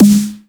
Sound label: Snare Tom